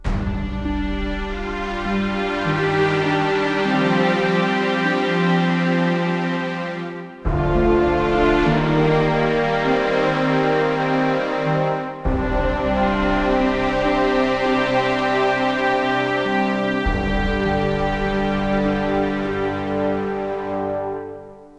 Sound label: Results Lose Loop